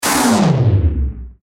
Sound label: Hit Big Power Down; Sound Design